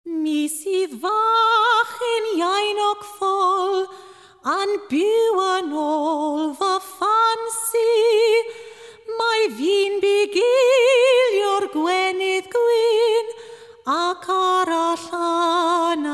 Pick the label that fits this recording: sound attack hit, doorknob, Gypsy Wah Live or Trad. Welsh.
Trad. Welsh